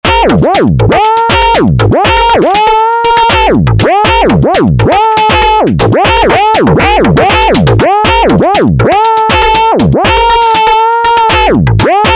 drums

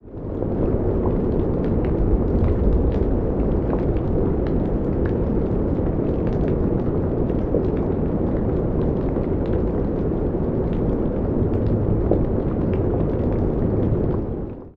heavy rain short; atmospheric; ambience and background fx; lo fi; nova

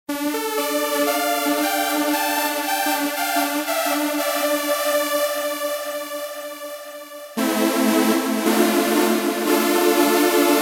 Techno Pad

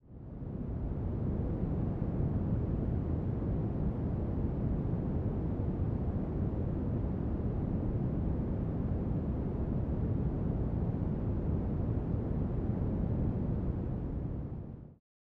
rocket; noisepalette; sirenfx

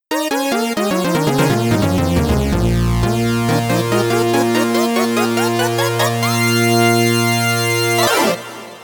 voice; the transmitter soundset

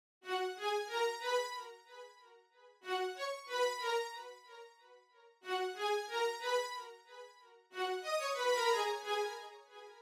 goldchain strings; player vst; sound selection kits

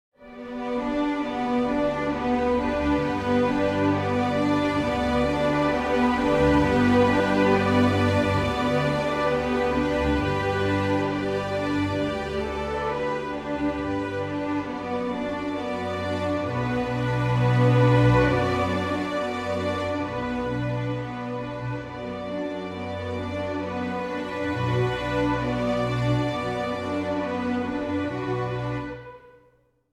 strings; minim strings; tempest instruments

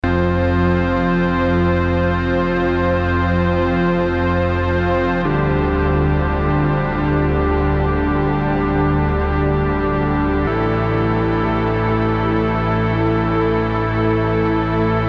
prophet pad tapewow; morphoice

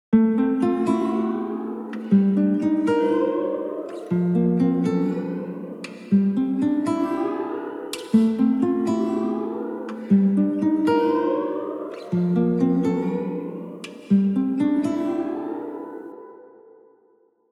delay shimmer acous; anise glide reverb; audio spices

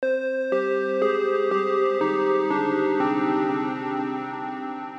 synth; passport; sm-271 calculator synth

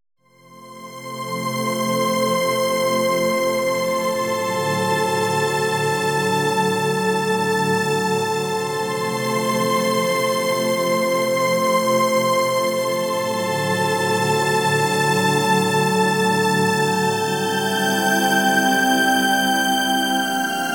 Forever Strings

